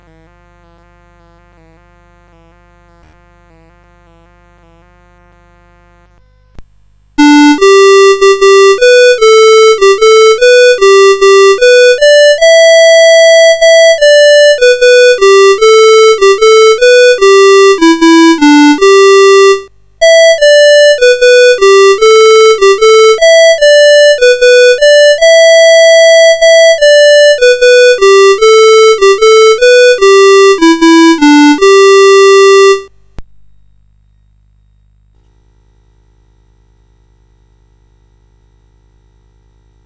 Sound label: Auld Lang Syne, CSG8580R5